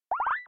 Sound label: Speakers Undeafen